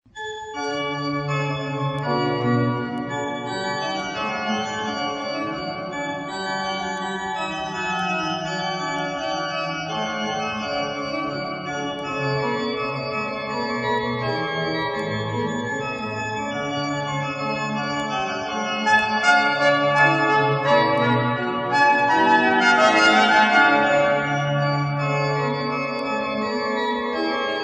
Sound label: organ trumpet; torelli; allegro